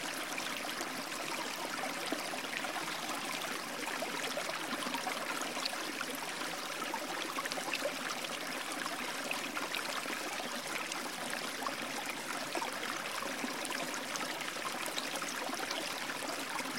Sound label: Natural Water Sound Ringtone